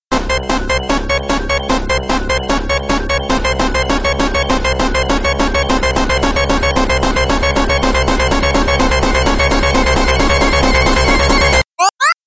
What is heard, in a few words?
drowning